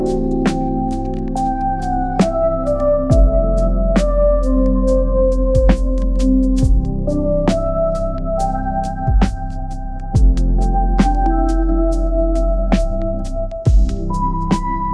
rainy window reverie

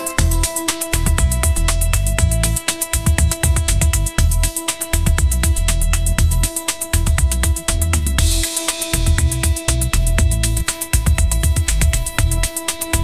ultra pop ringtone groove

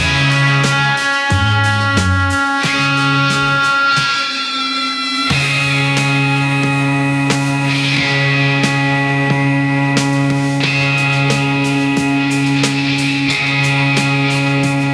epic highway run, ringtones; ai

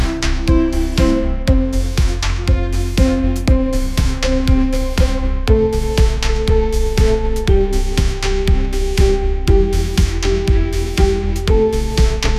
epic pop anthem rising

